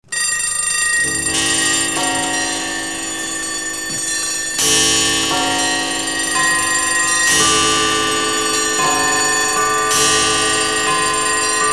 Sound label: Too Many Clocks Alarm